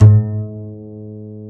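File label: double bass G#1; Univers Sons; Jazzistic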